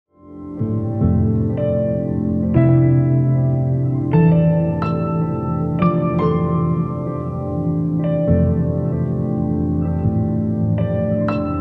Click Clap

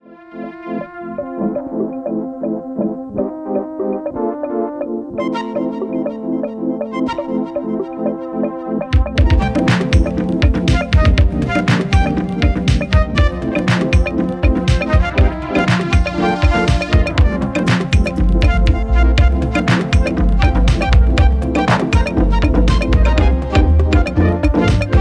Fluted

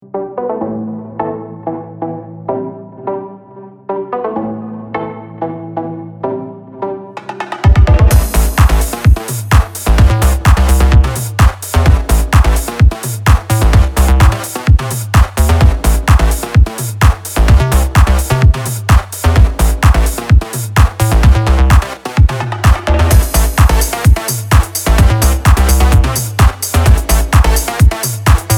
Buzz Saw Dub

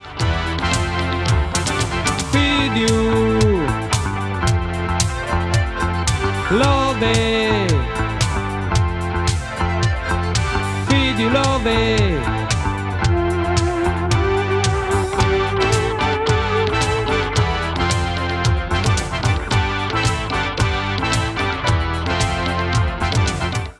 Instrumental

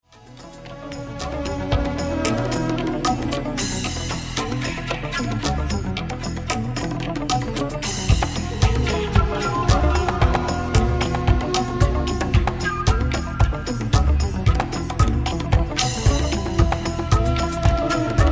Tubular Bass; Arabic Edits.; Disco; Indie; Outernational

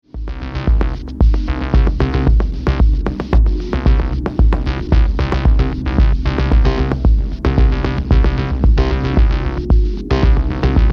drum machine dreaming

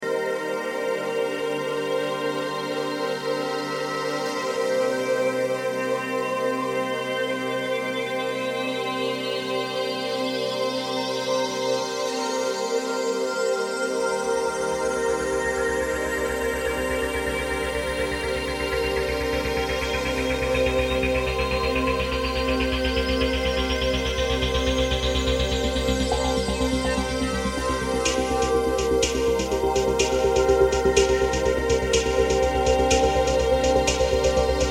Chord

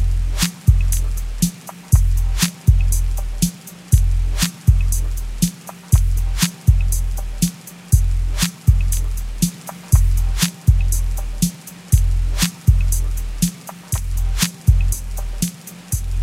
djembe dub

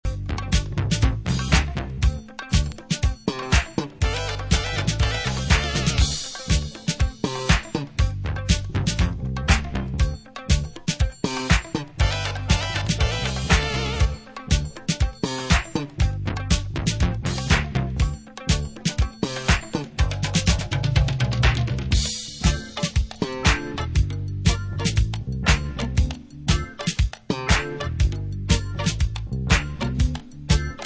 rock the beat (instrumental)

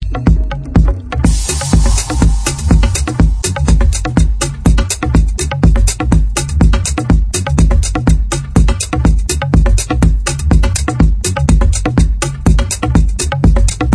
drumming man

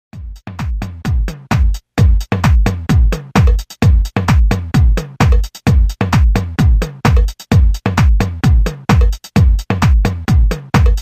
beatdown drums

